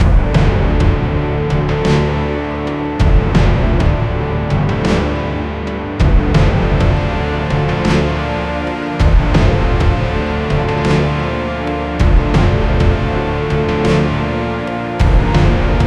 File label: dark fantasy battle music